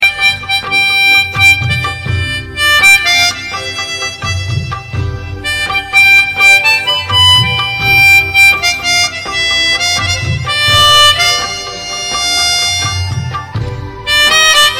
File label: Mouth Organ